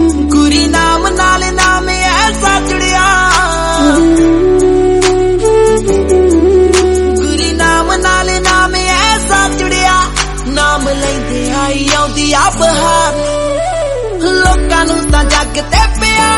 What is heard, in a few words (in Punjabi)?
punjabi song